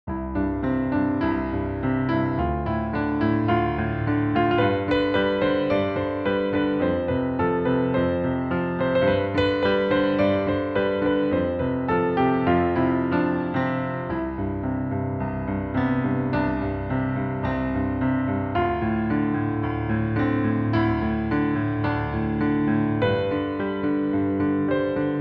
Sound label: first piano composition phantasia